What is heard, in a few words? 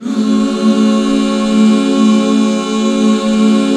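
HAL F# minor, Back Chords; Sound & Vision - Gigapack II CD 1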